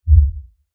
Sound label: LFE Hit, Lfe Hit Low Bass Impact; Blastwave